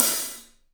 Paiste hat, drums; 3D Sound

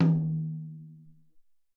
Soft Tom, Alesis SR-18 Drum Machines; 300 Drum Machines

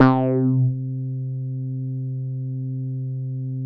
Oscar bass; Big Fish Audio - First Call Vintage Keyboards; OSCARBASSICS

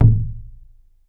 SAMM CHU, KOREAN DRUMS; AKAI S6000 CD-ROM; WorldSounds

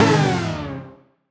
Poly Chord Hits, Ramp Down, C; future rave

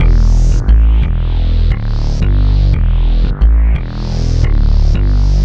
Synth Bass; Bass X

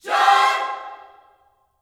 JOY C MAJ, Gospel, Vocal; ILIO; Vocal Planet

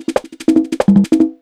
Conga Play, Yamaha DD-35

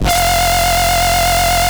BASS SHOT Formant

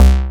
BASS FM, SYN BS; AKAI S6000 CD-ROM - Volume 1; SAMPLE WAVE MIXING 2; SYN BS #3

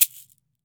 SHAKER; AKAI S-Series CD-ROM Sound Library VOL-5

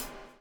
BUBBLE; Spectrasonics - Backbeat

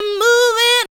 ADLIBS 90; Ueberschall; Jam Box

